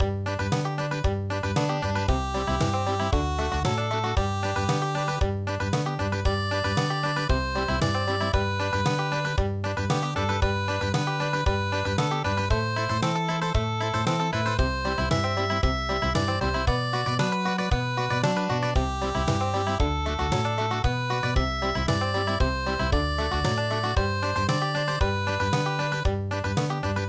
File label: Country, midi